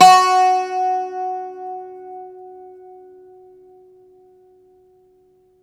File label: Irish Bazouki